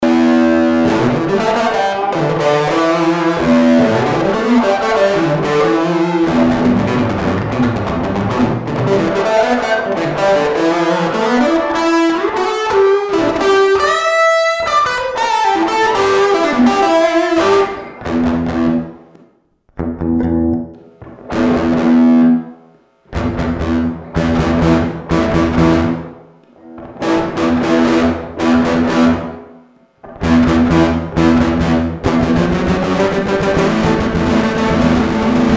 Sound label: HD DSP with Dist 2